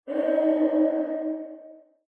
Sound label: ui target red